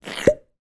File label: cartoon squelch pop; zapsplat